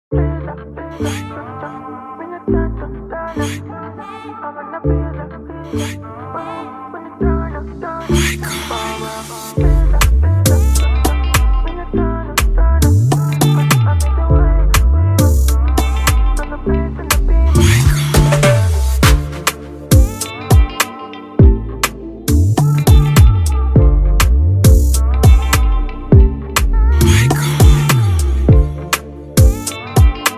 Afrobeat Instrumental

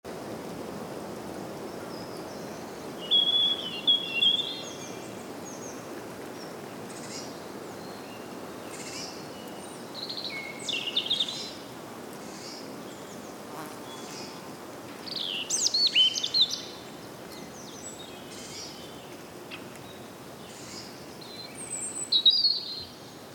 Forest Sound Effect; bigsoundbank

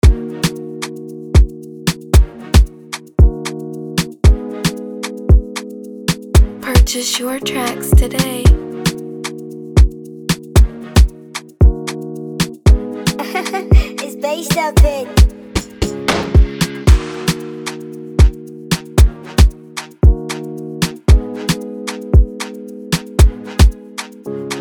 Amapiano beat 114bpm F major

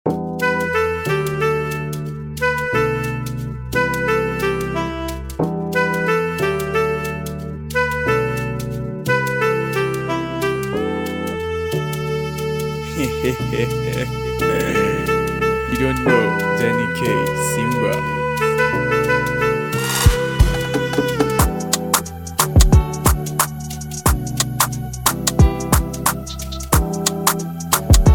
Afrogroove Instrumental

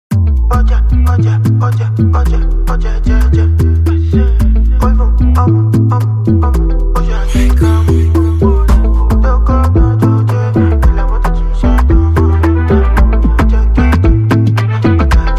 Afrobeat Instrumental

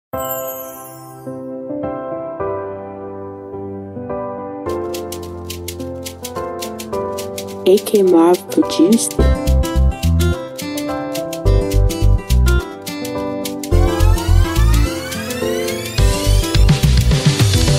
Afro Pop Instrumental

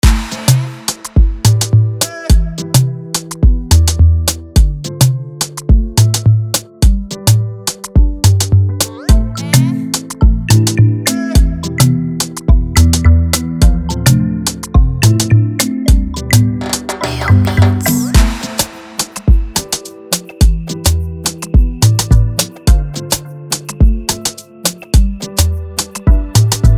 beat

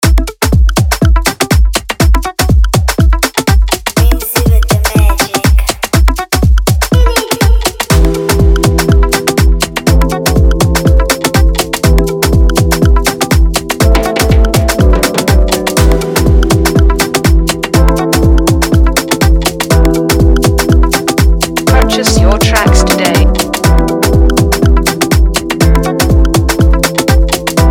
Afrobeat Instrumental

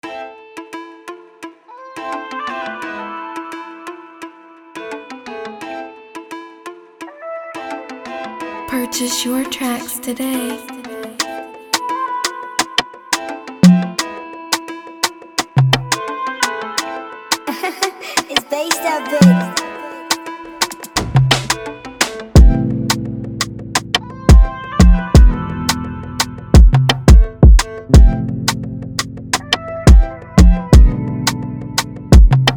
instrumental Relax 86bpm C major

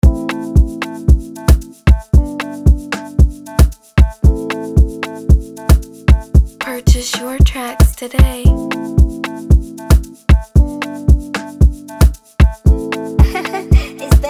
Free beat 114 bpm C major

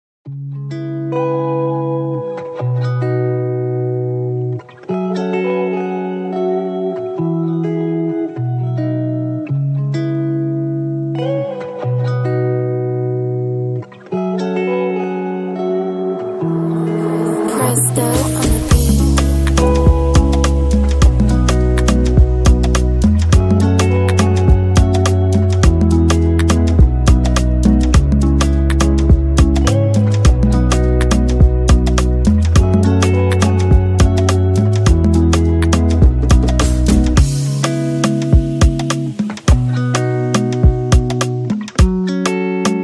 Afrobeat x Afropop Type Beat Instrumental

